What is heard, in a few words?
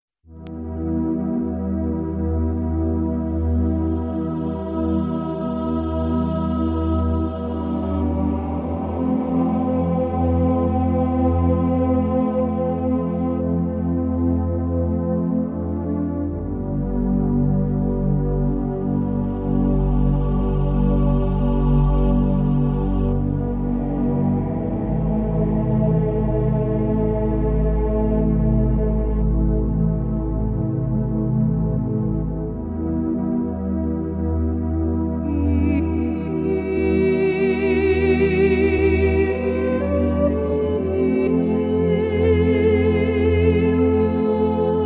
432 hz